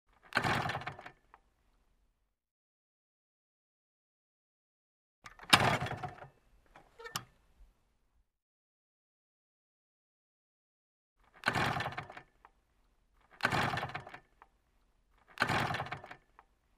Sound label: Motorcycle not start